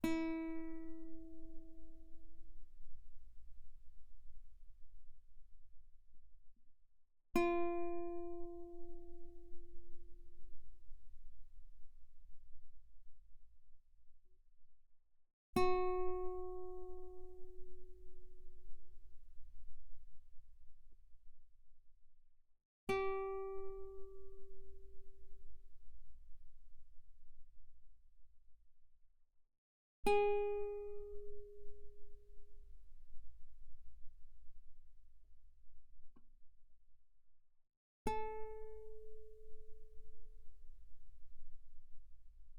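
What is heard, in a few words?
Guitar mezzo forte sul E E4 B4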